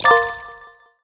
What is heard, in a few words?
mission complete; cdogs